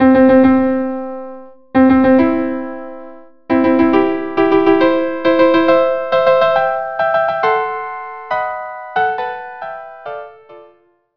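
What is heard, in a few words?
violin viola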